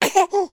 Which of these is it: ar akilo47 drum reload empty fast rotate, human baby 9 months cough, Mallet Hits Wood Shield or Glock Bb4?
human baby 9 months cough